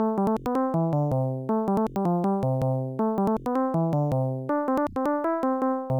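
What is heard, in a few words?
bonus buzz; prehist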